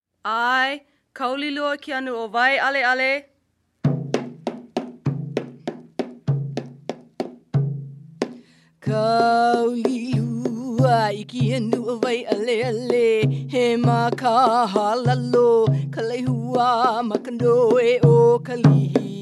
Hawaiian Drum-Dance Chant

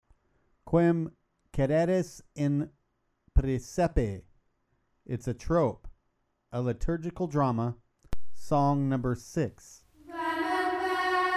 trope, liturgical drama; Quem queritis in presepe

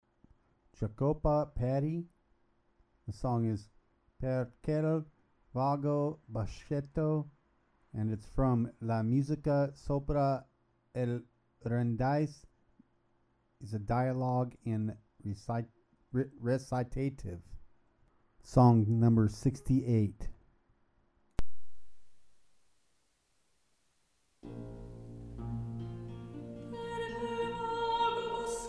dialogue in recitativ; Jacopo Peri; Per quel vago boschetto; Le musiche sopra l'Euridice

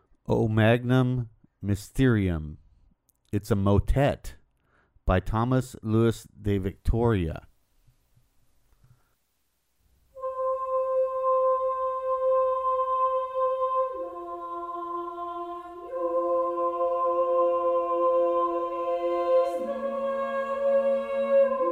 motet; Tomás Luis de Victoria; O magnum mysterium

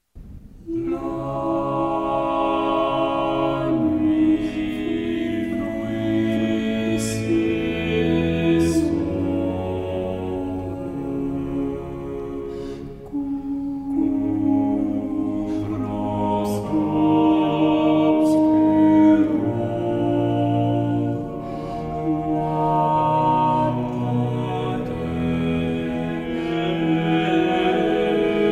chanson; Orlande de Lassus; La nuict froide et somber

